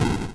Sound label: fire explode; doublespeakgames